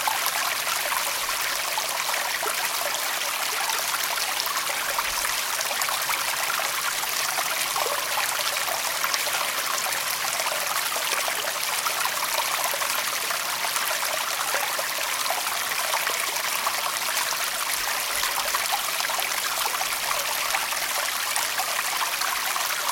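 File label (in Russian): тонкий ручеек вытекающий из водоема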